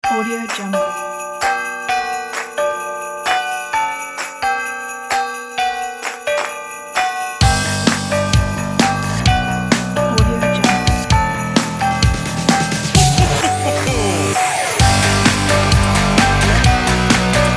christmas rock music kit

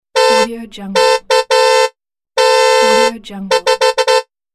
car honk sound

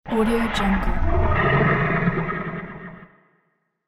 the sewer monster

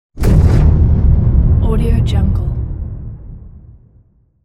big bass explosion

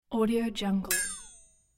twang down

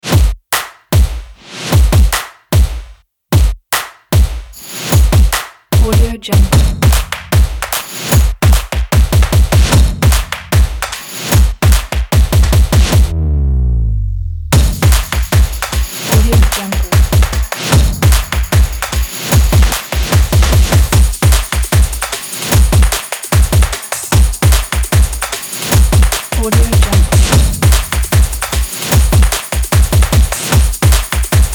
drums percussion kit

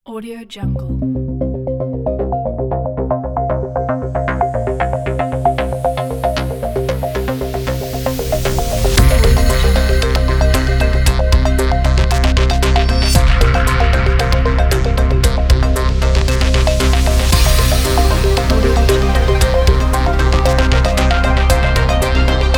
hitech future intro logo

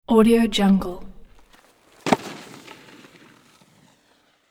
jump and land

